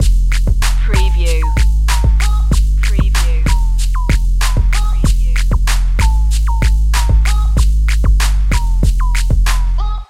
beat the sax loop

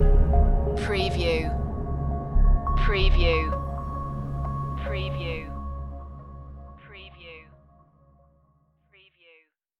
stinger

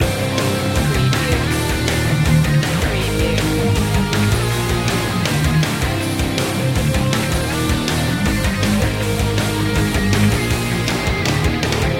aggressive action loop

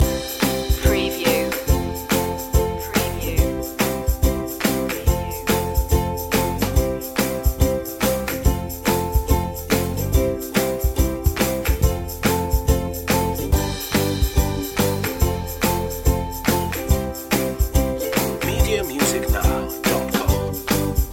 jolly ukulele loop; positive music

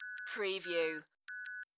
Computer Readout

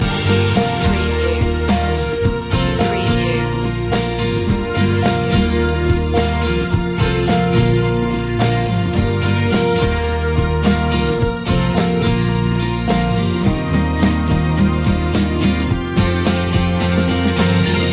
brighter day loop

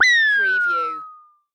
Retro Scifi Hit

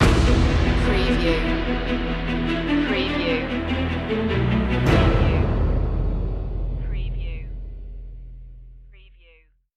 take action stinger

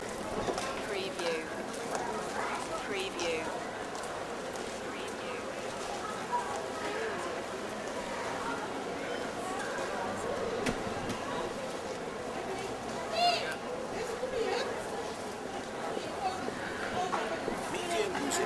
pedestrian street crowd

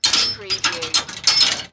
Chain Metal Clanging

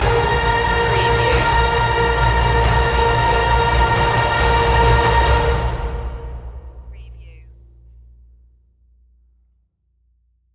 stinger orchestra

